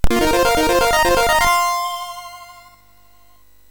sid MOS6581R3; Ballmania 2nd version

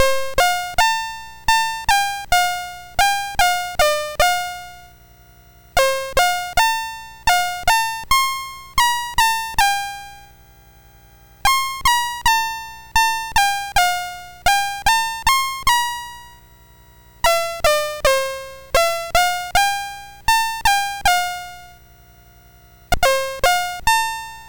MOS6581R4, GAMES; Trail West BASIC

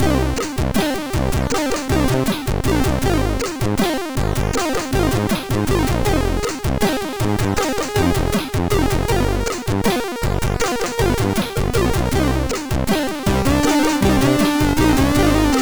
sid MOS6581R3; Lost

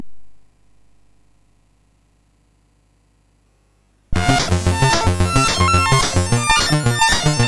Level Up, BASIC, MOS 6581

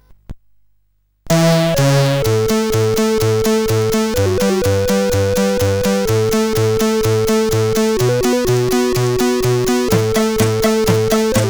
Happy Tune, CSG8580R5; Struebing Volker

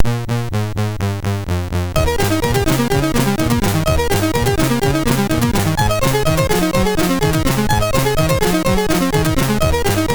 Rock and Roll, sid MOS6581R3; Signor

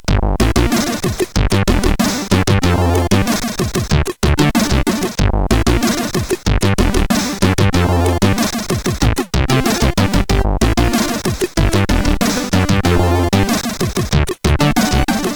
wild bass, CSG8580R5

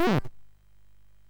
Shuffle, MOS6581R2

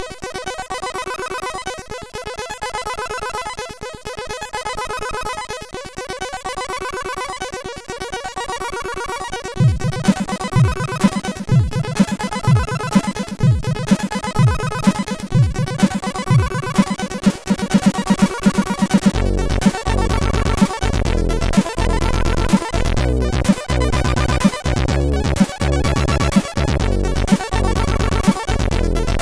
Kling Klong, sid CSG8580R5

